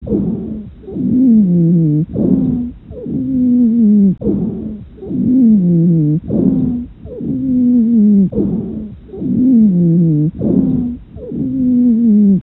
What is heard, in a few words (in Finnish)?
vinkuna, hengitysäänet; ääninäytteitä auskultaatiolöydöksistä